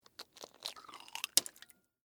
Tear Rip Egg Shell, Texture, Tear, Rip, Egg, Shell, Open, Soft; Ripping; Vegetable; BlastWave